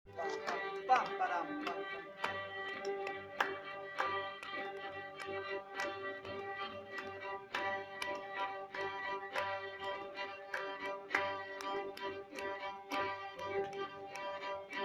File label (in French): Rythmique violons; Mazurka; Musique d-ensemble